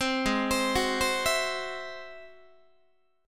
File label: Ab Augmented 7th Ab down Guitar; Ab+7 arpeggiated; JGuitar